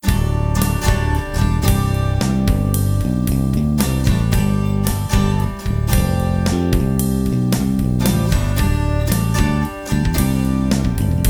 harmony karaoke

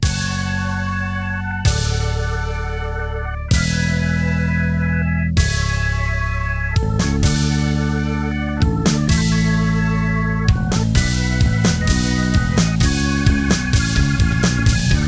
male keyboards bass; music smorgasbord

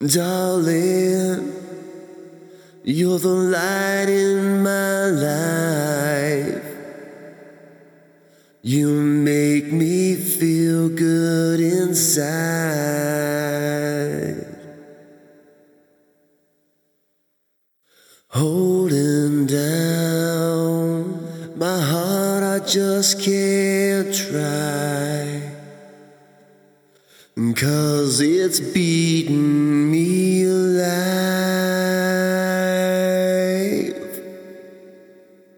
male keyboards bass; music smorgasbord

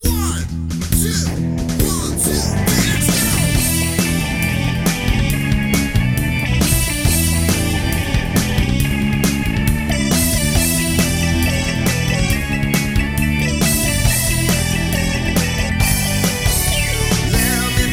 male keyboards bass; music smorgasbord

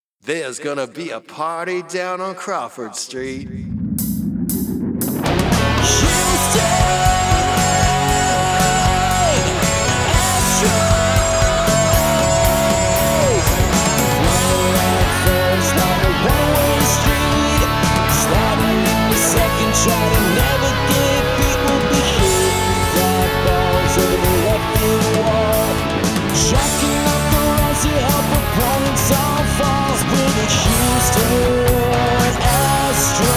electric karaoke harmony

